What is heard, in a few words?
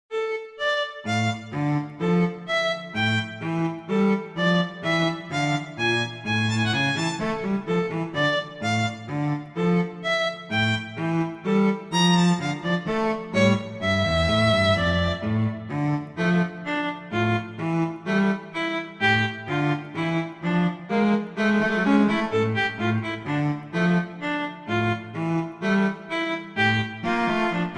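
Tempo di marcia, violin viola; Mazas; Duets; Duet 5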